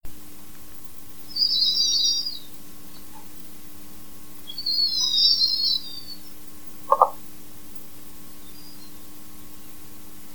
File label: beaked whales loud noise reduction; arnoux; rogers